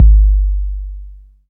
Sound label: wet kickdrum sample g key